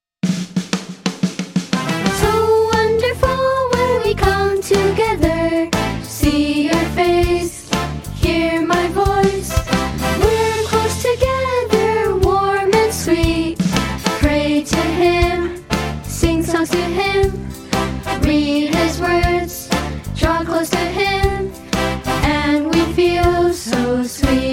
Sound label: Meeting song